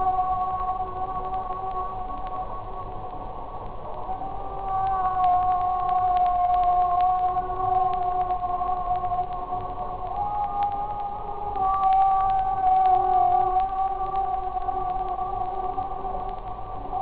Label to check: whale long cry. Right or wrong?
Right